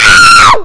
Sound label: hit, damage; LumiCu 2.0; minecraft